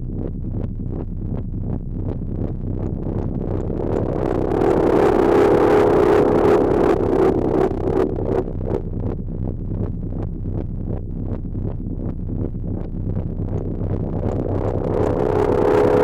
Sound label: RUMBLE; AMG - Vince Clarke Lucky Bastard